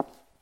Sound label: tile step; Original TK Sounds